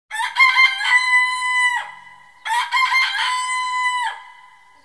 Rooster, alarms; Mi Mix 2S Stock Ringtones

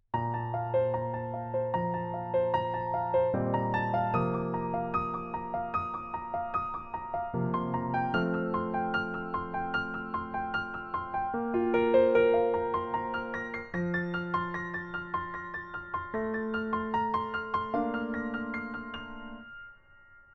tactfully, alarms; OnePlus 5T Stock Ringtones

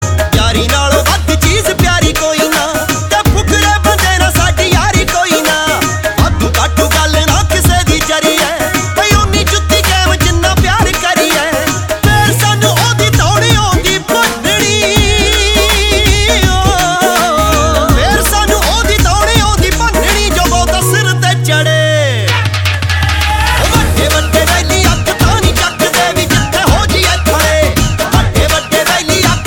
Punjabi Bhangra Tones

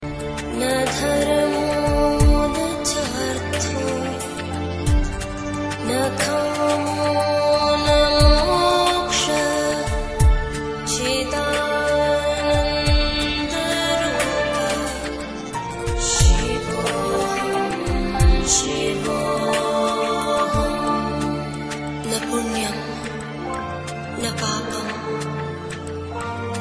mantra shiva; Navratri Special

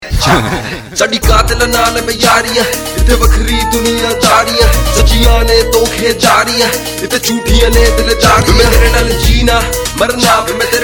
Punjabi Remix Tones

